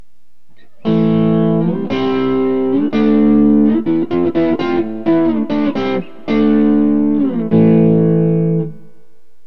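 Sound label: bb2 neck, guitar pickup; burny; fugly clips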